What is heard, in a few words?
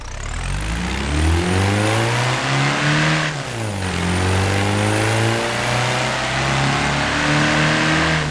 first, vehicles; TDMCarpack; beetle